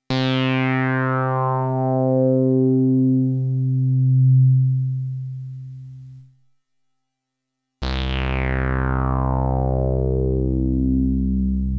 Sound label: Modal 008 without constant with it with drive